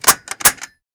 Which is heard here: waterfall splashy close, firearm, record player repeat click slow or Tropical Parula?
firearm